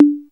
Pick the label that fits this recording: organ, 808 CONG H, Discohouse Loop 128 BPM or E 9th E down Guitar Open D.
808 CONG H